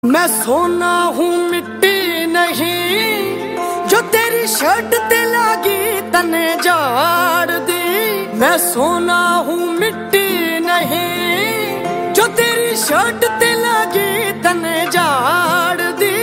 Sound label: Hindi Ringtone